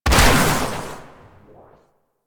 Amp Barrel Fire; Weapons; Warframe; Operators; Amplifiers

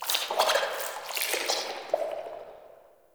Root Cave Sap Gush; Ambience; One Offs; Neathuns Root Cave

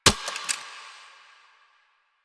Shot Mech, Weapons; Warframe; Acceltra Prime

